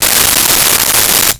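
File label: Ratchet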